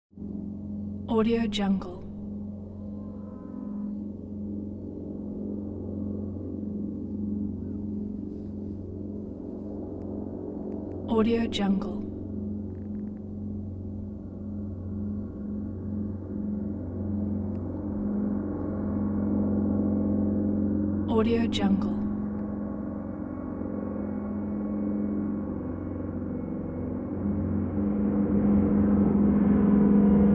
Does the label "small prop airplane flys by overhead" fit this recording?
Yes